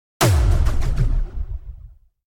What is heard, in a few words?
explosion; NCH Library